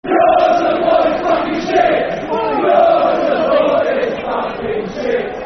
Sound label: Your Support Is Shit Football Chant Soccer Song; Watford Wfc